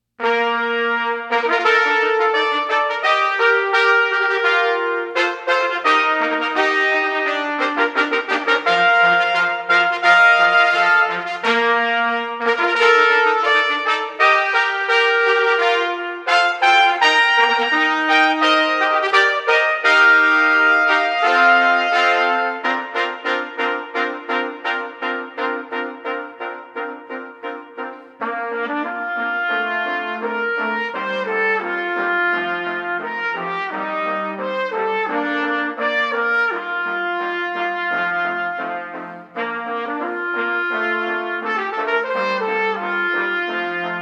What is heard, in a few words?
Fanfare for Taps; 100 Nights of Taps Gettysburg